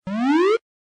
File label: GAMBLE WIN; Plenty Of Fruit 20